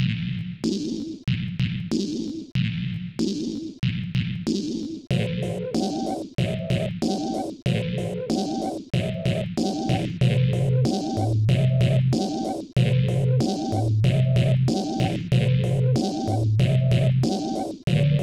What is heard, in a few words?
Sinewave